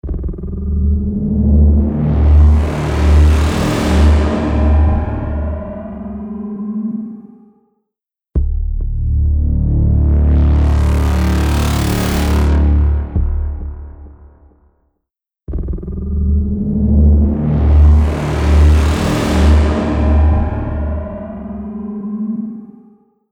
Harmonizer Bass Synth Dual; H949 Harmonizer; Preset: Leaving the Atmosphere (Dual)

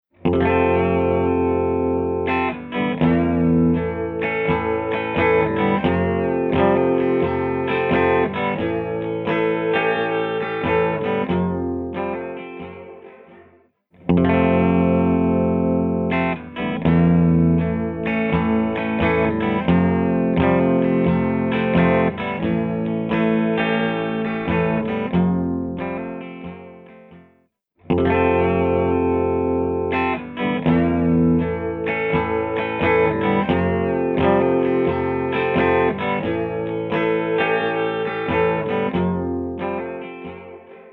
Tail Decay Stereo Spreader Guitar; Electric Guitar; H9 Bundle; Plug-ins: TriceraChorus (Tail Decay) + UltraTap (Stereo Spreader)

